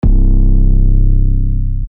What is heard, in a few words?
808s; AUDIODE; EPSYLON; epsylon drum kit